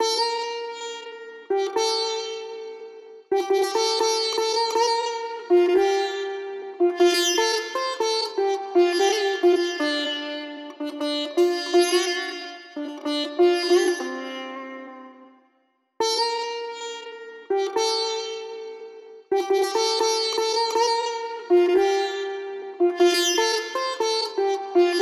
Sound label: Sitar; raji an ancient epic